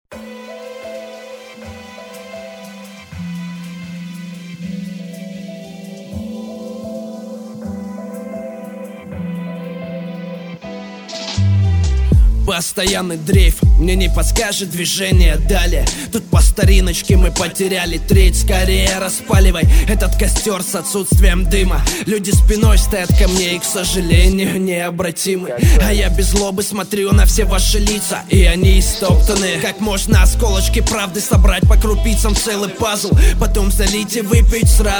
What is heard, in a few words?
Beat; Crash; BeatFM